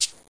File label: cabaso